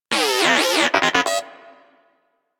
Moa Inquisitive Vocal Greeting; Warframe

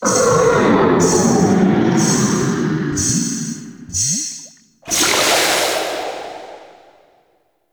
Fishing Five Second Timer; Duviri Maw Fishing

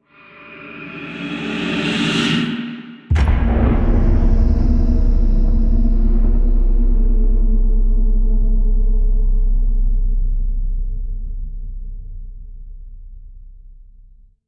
Boom; Warframe; Clan Dojo; Dojo Misc; Dagath

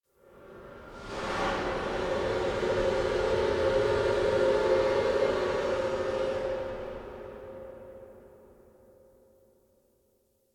Steam Blast A; Warframe; Ambience; Spawn Rooms

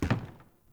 Wood Stool Impact Light; Objects

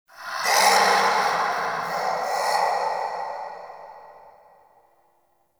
Texture Interior Large, Ambience; Sentient Lair; Warframe

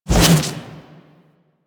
Melee Jump Slam Short Charge, Whooshes; Weapons; Warframe

